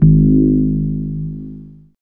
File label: BASS; E-Lab; X Static Goldmine 1